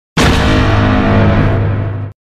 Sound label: seismic charge